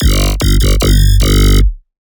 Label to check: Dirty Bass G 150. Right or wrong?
Right